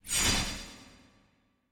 Teleport Vanish; super mombo quest

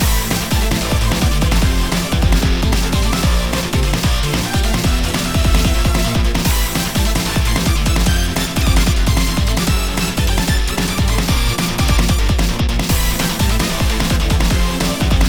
Challenge stage Loop

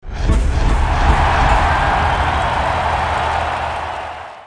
ui minigame dance game countdown; lego movie videogame; xbox 360

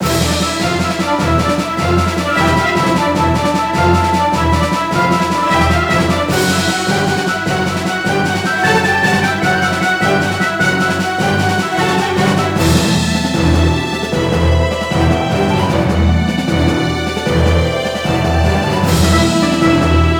Battle; mercenaries wings the false phoenix

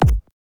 SFX - Landing; Stumble Guys; Android

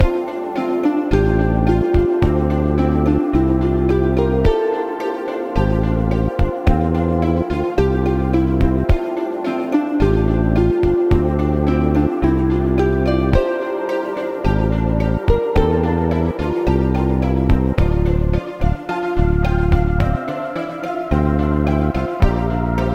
Sewers Loop

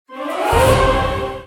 Jingle Shock Short; Ankh

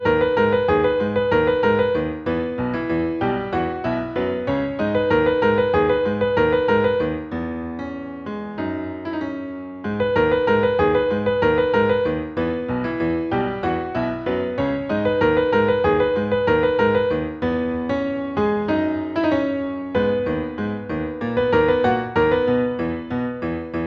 THE PIANO

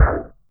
Dartgun; Cruelty Squad

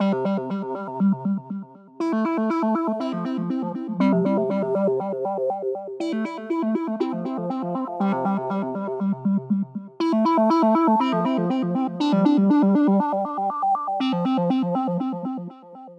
Caves Loop Synth; BouncyBoi in Puzzle Land

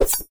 Monster Claw; A Robot Named Fight

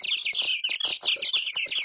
Dizzy birds

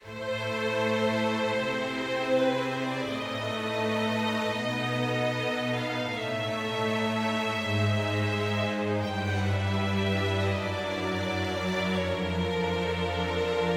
dark forte; Quivr; PS4 Windows gamerip 2016

